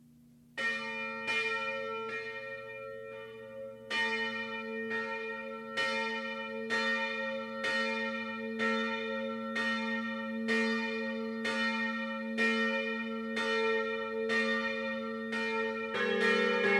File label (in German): Glocken; Gachnanger